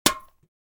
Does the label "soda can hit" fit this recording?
Yes